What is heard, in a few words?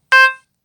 Ding